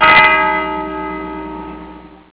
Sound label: Clock Tower; urmager; verdensur